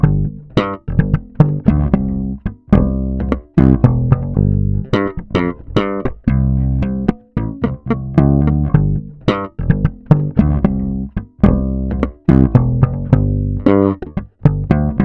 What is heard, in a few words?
Bass Slapping Gm C